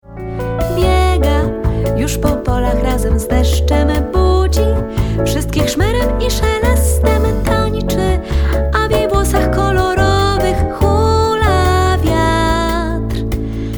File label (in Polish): bossa nova